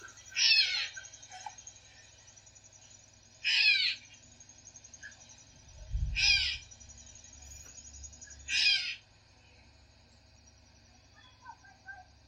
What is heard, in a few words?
Gray Catbird